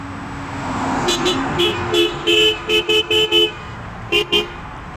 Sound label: car collide with car; collisions